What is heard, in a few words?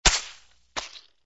drop sandbag miss